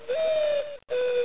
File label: Menu Command